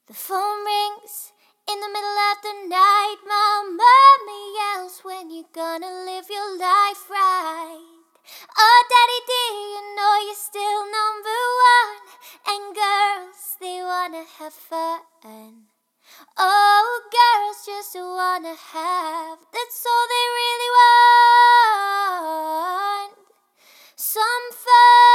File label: SINGING